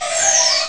space sight; Amiga Soundtracker Sample Packs

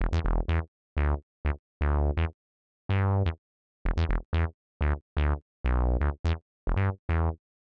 125 res synth bass E; PowerFX - Nu Jazz House

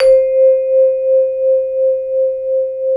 MLT VIBE

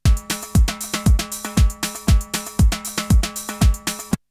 Drumloop; ZERO G - Datafile DISC 2

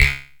Perc, Korg Wavestation

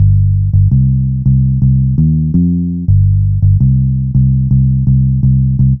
G1 165bpm, Drum & Bass, Bass Loops; Total Drum&Bass

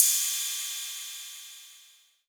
Ride Cymbal; Best Service; House Definition VOL-1; Cymbal Stuff

